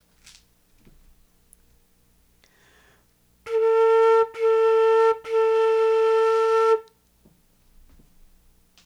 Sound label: flute low; mid-ranged tone being played on a modern flute; armstrong